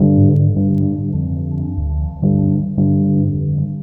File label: Dirty Wurlie; Zero-G; Soundclash Nu Dance Electro; Acidized Wavs; FX Atmospheres